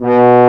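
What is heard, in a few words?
French Horn mf B1; Digital Sound Factory Virtuoso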